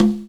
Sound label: Conga; Equipped Music; Loungin House